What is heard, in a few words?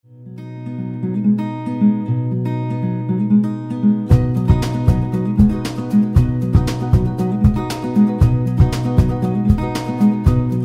Acoustic Guitar Playing Around